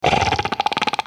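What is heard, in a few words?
attack, basilisk; basalisk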